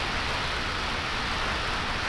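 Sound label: ambience steam; Star Wars Knights Of The Old Republic II; XBOX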